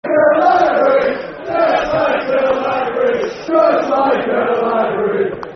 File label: Football Chants (Soccer Songs); Just Like A Library; Hull City Hcfc